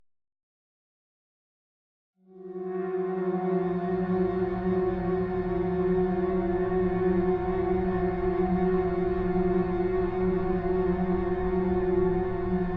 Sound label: midiflute; katrine